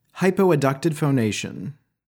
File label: Hypo Adducted Phonation